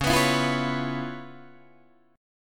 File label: C sharp 7th Sharp 9th, C sharp, down, Guitar, Open D